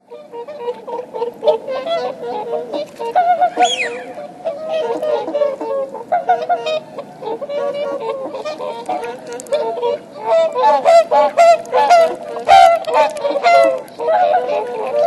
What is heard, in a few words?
anas penelope